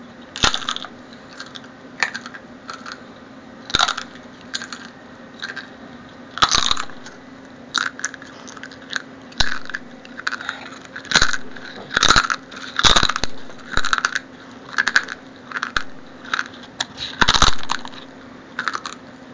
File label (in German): Geraeusch Nudeln